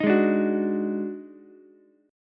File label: Eb Minor Major 7th Double Flat 5th, Ukulele; JGuitar